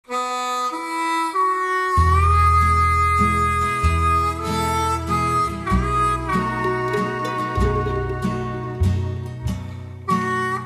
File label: wooden porch waltz bounce; sonoton